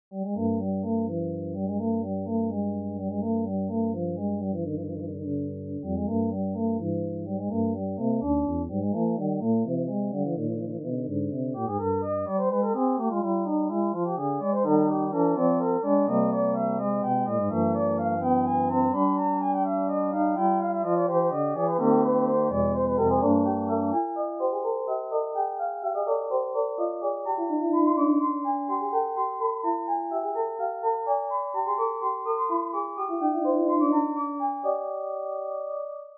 Diasem Phrygian Example 26edo

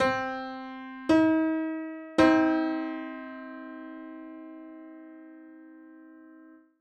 Piano 7edo

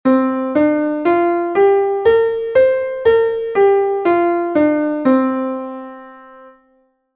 5edo scale

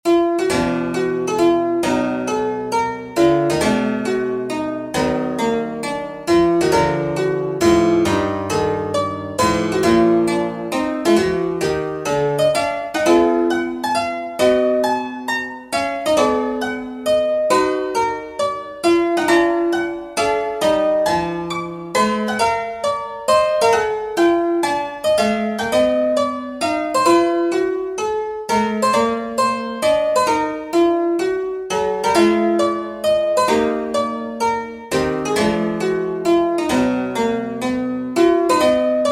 Diasem Dorian Example 21edo

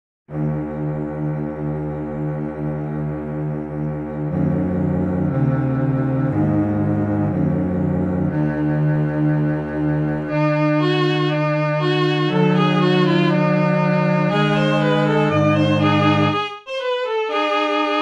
strings

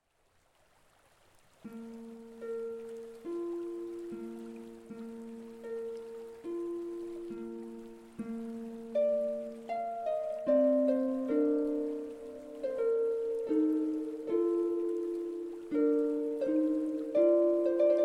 Harmonics 14-28; Nick Vuci

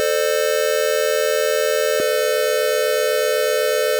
Electra chords

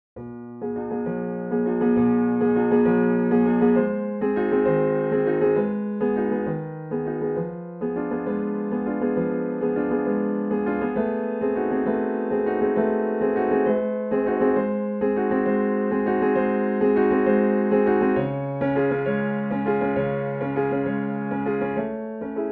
24EDO Solo Piano Miniature

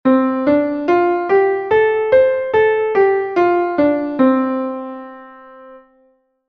Suspended Pentatonic (19-EDO)

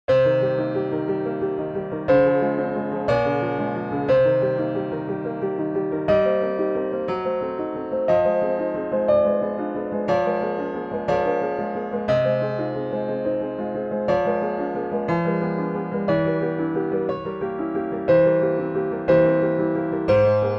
16edo Prelude

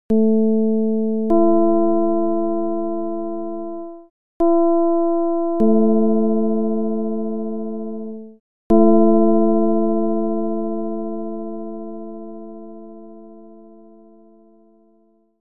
csound foscil 220hz

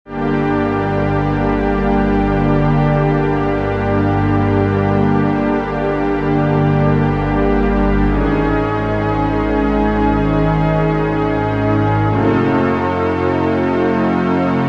Frameshift Cadence

